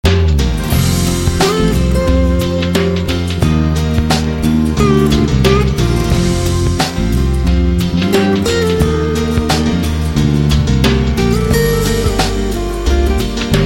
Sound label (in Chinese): 片头音乐